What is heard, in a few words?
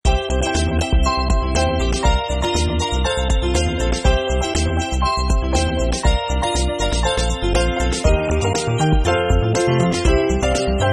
Battle Main Bgm; doraemon gadget rush android ios mobile gamerip 2015